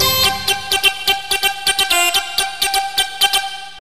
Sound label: synthy loop